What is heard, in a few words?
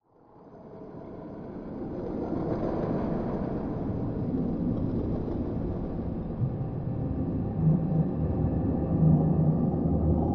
spooky ambience